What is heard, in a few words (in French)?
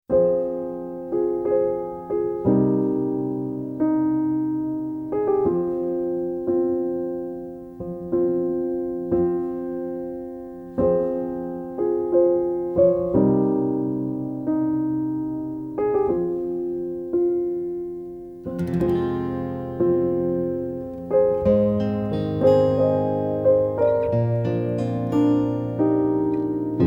Amusette pour Piano et Guitare; EN SOLITAIRE Original Soundtracks